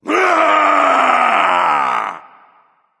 battlecry; smite; poseidon

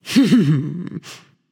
heh heh; smite; amaterasu

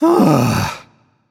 sigh; voicepack; smite; merlin

